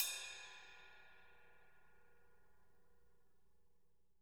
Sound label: ride